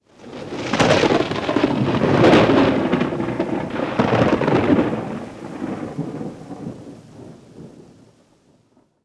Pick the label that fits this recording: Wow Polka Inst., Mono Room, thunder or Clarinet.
thunder